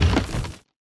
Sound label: car wood impact; factorio 2.0.55